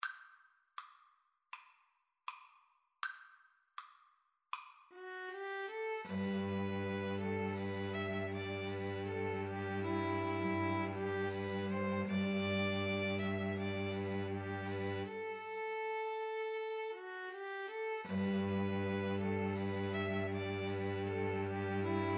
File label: 2 Violins Cello